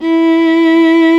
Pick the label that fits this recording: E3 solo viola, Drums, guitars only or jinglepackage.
E3 solo viola